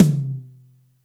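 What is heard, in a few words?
TOM soft; Zero-G; Chemical Beats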